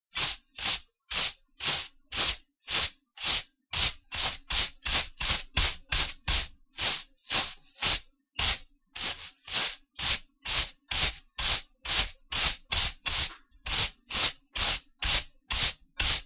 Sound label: Sweeping Floor, Sweeping tiled floor with coarse hair brush.; Cleaning; Household